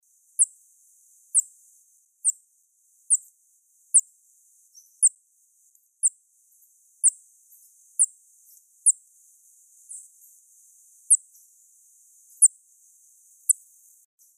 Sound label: Florisuga mellivora, song; S. America; Eastern Lowlands